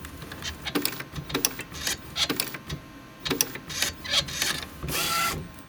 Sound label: printer laser